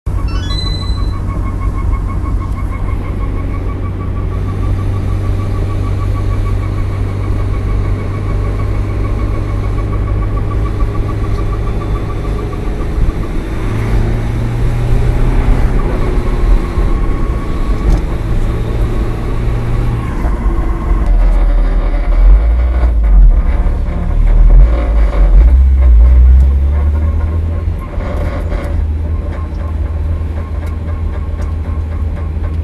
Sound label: creaking squeeking driver rear wheel wine; s2000 under hood